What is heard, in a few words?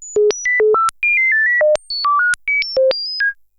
Droids